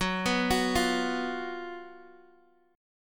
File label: Fsharp Major 7th Suspended 4th Fsharp, Guitar DADGAD; chordshape; down